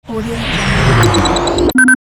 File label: turning off the machine